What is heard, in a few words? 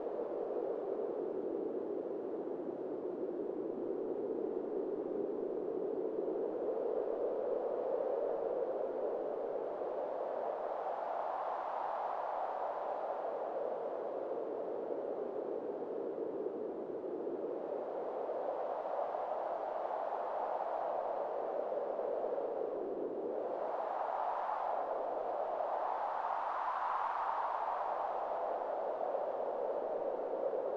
atmos cave misc rise and fall; Hollow Knight: Silksong